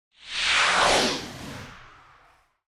Whoosh Short Jet; Hollywood Edge; sound designer's toolkit v2

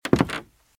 Footsteps - Wood - Creaky - Walk - Left

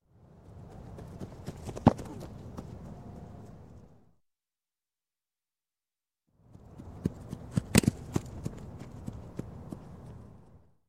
Football Running Catch; Sound Ideas 2000 Series

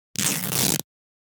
Fabric Tear Rip - Foley Cloth Movement; Hollywood Edge; sound designer's toolkit v2

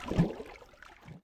Underwater - Swimming; RadwinIsland

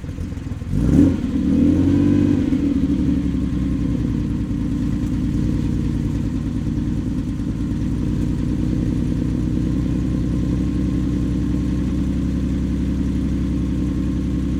Ferrari Dino Idle Loop; Automobiles

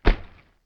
THUD Dark; mono; Universal Sound FX; THUDS & THUMPS

